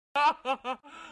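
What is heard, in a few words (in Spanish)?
risa burla, isketch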